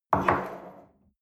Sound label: espresso place on table slide soft, restaurant cafe